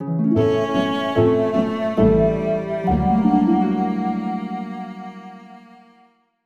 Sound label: Result Eliminated Game Over; Legend of Zelda Skyward Sword HD